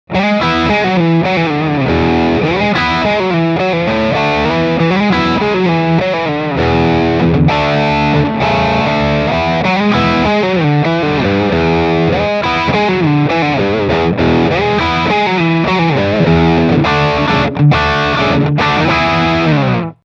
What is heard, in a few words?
Medium Gain Low Tone Riff